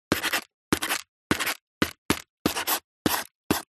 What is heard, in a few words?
Drawing Graphics Tablet; D&H; soundbox library